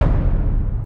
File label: attack; Hanuman Return